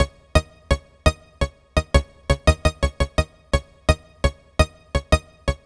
Synth, Trip-Hop Loops; Sonic Foundry (Sony Creative Software); Rondo Brothers

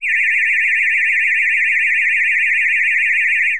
sci fi; Masterbits - Switch FX

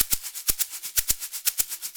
Soukous; Spectrasonics; Supreme Beats; African Contemporary

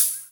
HH, Dance Mega Drums; Best Service; HH HIP

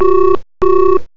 DIAL; EFFECTS; M8; CMI Factory

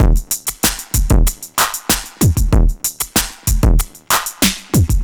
Electro Drums 95; musicradar

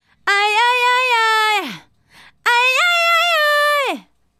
Female Solo, Hip-Hop and RnB Vocals

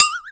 JD800 Internal Drums, Roland JD800

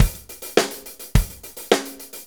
4-4 Loop, Drum Loops, Full Kit Loops; Drum Tools

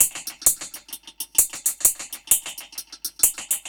Dub Drum Kit C Wet Echo Hats 130bpm; musicradar; Dub Drums

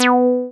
SYN 303, Roland TB-303

